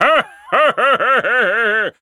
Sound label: Wizard Laugh